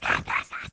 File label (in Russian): udar